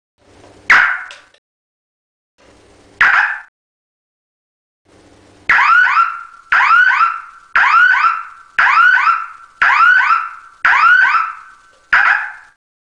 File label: siren